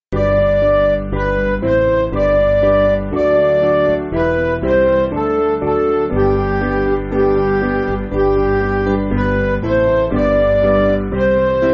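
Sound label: Music Backing Files for Churches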